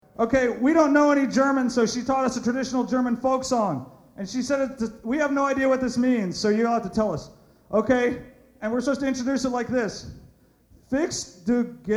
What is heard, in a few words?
German Folk Song; Happy Flowers